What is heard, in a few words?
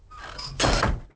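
chest close; The Elder Scrolls III Morrowind GOTY; XBOX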